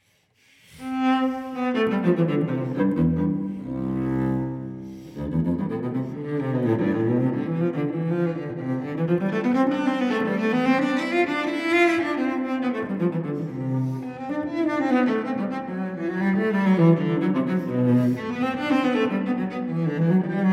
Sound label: Cello Suite No. 3 in C major Prelude; BWV1009; Johann Sebastian Bach